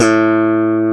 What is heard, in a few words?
SNAP A3, Bass; AKAI S6000 CD-ROM - Ultimate